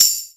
tambourine, House Oneshots; E Lab; XTc Files of House; Shakers and Tambourines

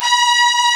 MAR E6, STRGS; East West; Global Sounds

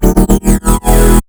150 Emaj Formant Chord

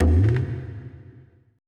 TABLA C1 R; BPC DRUMS

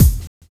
kick, Drum and Bass

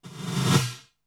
reverse

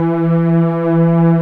STRING; VOY8 STRING; ESOTERICANLG

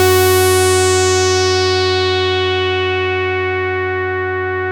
MC 202; Masterbits; Vintage Voltage

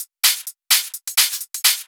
Hihat Loop 128 BPM; Best Service; House Definition VOL-1

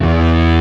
SYNTH GUIT; Mostly Leads

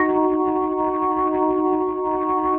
ORGN 123, Keys; Houseworx

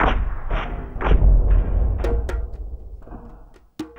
Conga Swish, Drums; Sonic Foundry (Sony Creative Software); Friendly Fire; Marc Steve

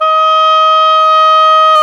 oboe; Roland LCDP04 Orchestral Winds; Oboe Brth 1

